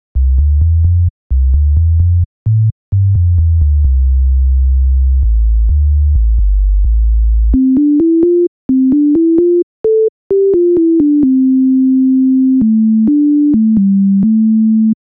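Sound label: sine synthesizer; flstudio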